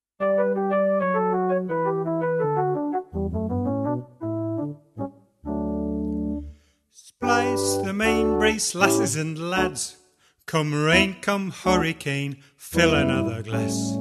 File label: Hornpipe; Saltaire